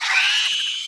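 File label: DEATH; giants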